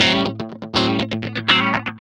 GUI, LICKS; 120 LICKS

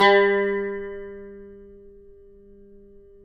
CUMBUS G, Guitars; Spectrasonics; Hans Zimmer Guitars VOL-2

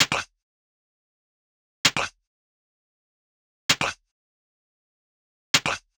Fx Vox Loop 130; Equipped Music; Loungin House

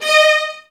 violins F; ILIO; Synclavier Strings

